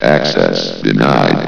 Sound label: no access